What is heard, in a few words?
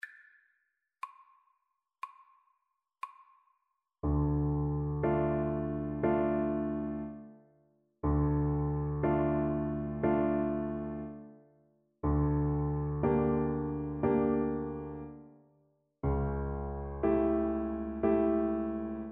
czardasz viola